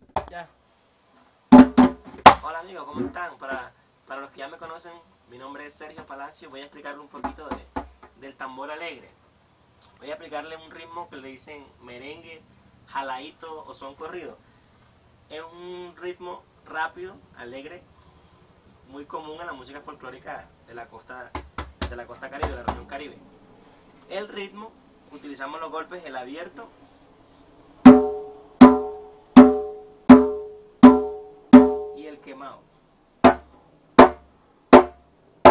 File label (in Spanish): Tambor Alegre